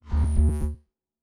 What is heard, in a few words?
Device Start, Electric; Sci-Fi Sounds